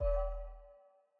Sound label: Matchmaking Player Found